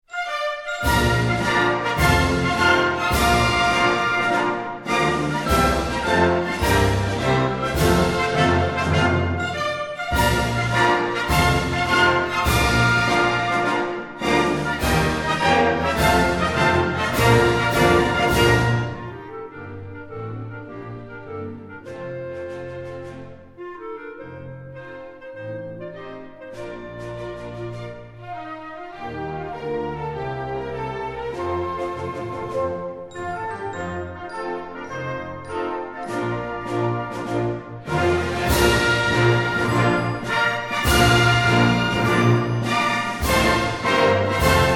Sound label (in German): hymne kamerun; Afrika